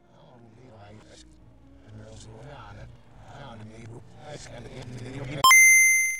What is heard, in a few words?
gameboy start; Temp Sound Solutions; Now Youre Playing With Powar 6